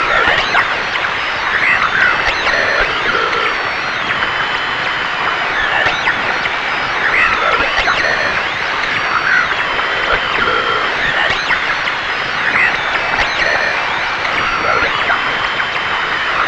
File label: statix; svencoop; aompa